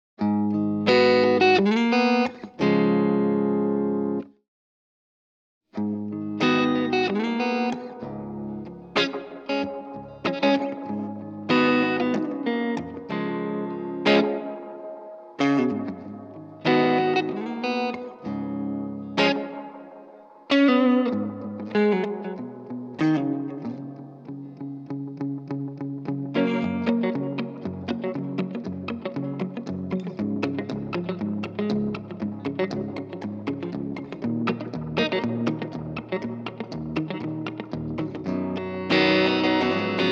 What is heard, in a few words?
TimeLine MX Can of Reverb, strymon timeline mx